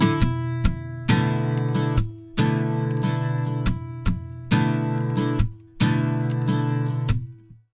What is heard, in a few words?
70 MIN CHD B, Acoustic Guitar Loops; USB Soundscan vol.04; AKAI